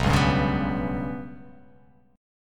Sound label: B Suspended 2nd Sharp 5th